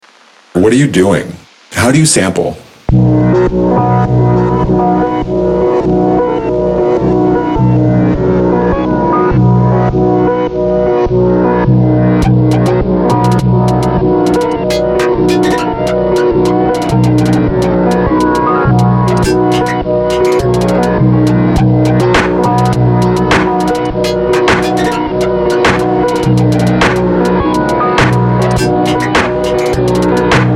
102 bpm needledrop interlude; weeklybeats 2026

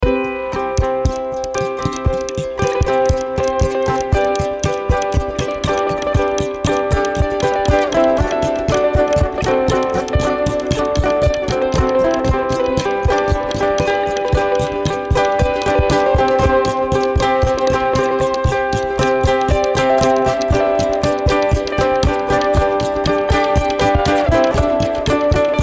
nothing but uke

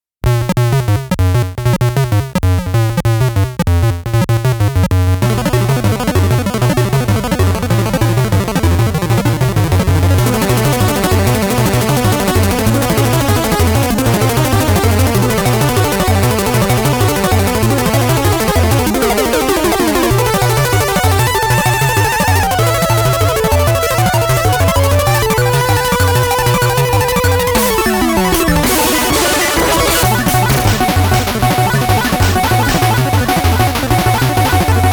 jingle; weekly beats 2020

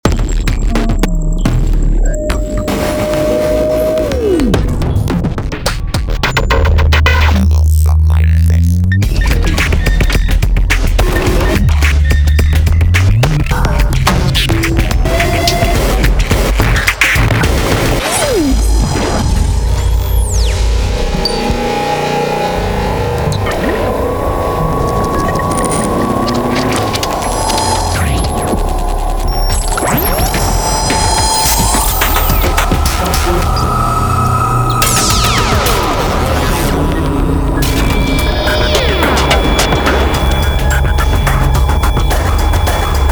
skittish synapse collapse 107bpm; weeklybeats 2026

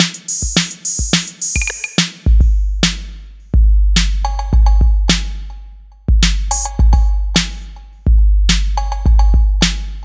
happy 808 day; weeklybeats-2020

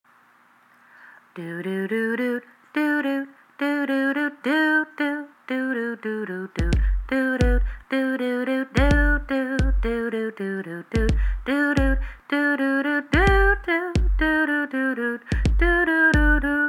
quirky walking music; weeklybeats-2014

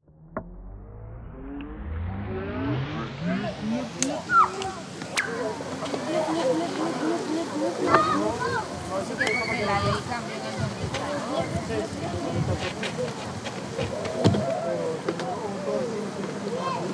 fieldrecording

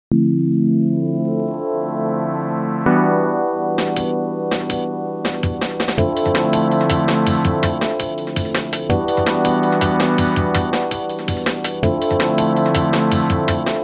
jungle practice genesis; weeklybeats 2024

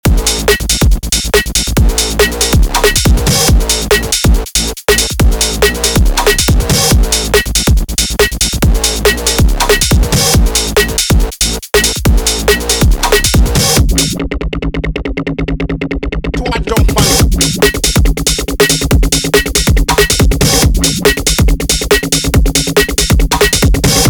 breakbeat; breakbeat something; weeklybeats 2026

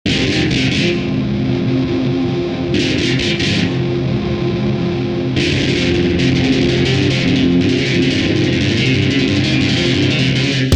midi guitar noodle

